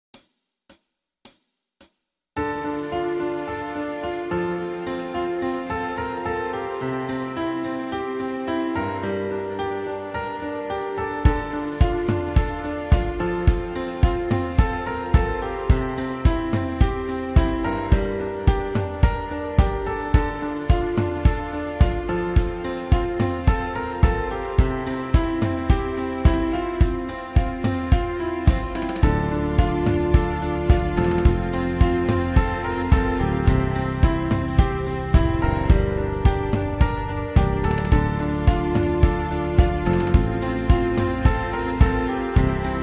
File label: (Instrumental)